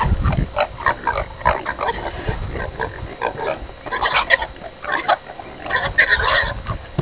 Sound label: Pigs